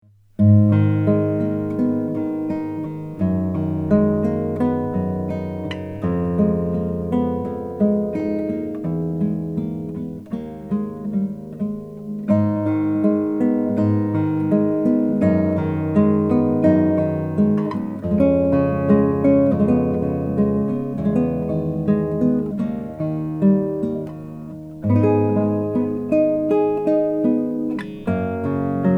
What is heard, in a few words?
maingard crossover brazilian rosewood